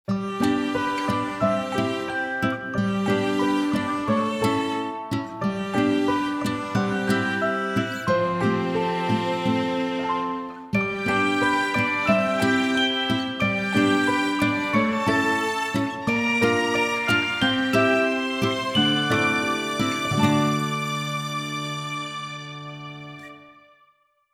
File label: Fail; Etrian Mystery Dungeon